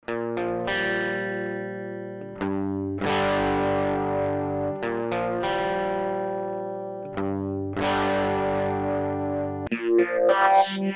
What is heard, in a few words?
timbral tremolo